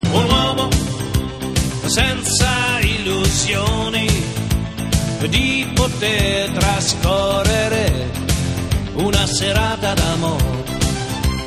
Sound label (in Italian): violinista